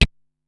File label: "Tchh", Korg Wavestation-SR Drum Kit